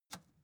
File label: record player power push off